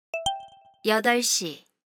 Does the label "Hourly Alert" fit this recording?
Yes